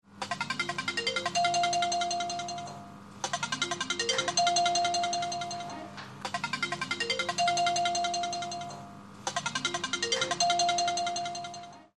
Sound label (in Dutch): telefoon; Kind op bezoek op de intensive care